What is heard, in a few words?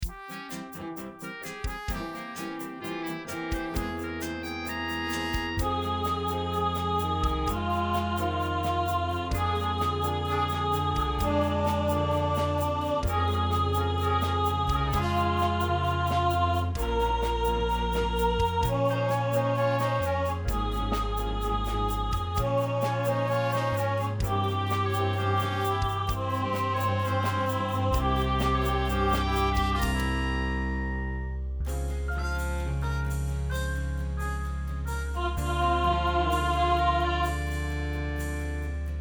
Tenor 1st Part